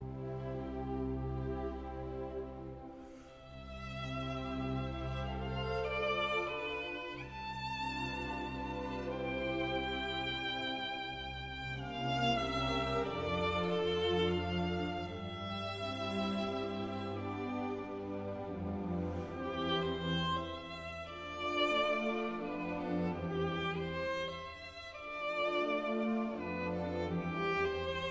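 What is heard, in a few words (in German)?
konzert fuer violine und orchester e moll op 64 andante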